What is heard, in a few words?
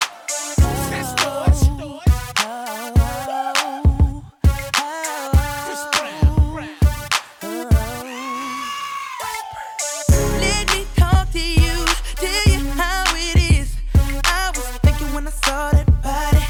HIP HOP SOLO ADULT